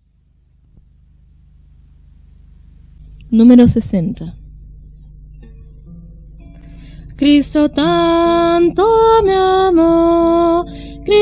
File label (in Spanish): Coro, himnario niños